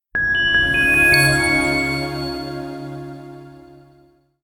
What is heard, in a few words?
simple clean logo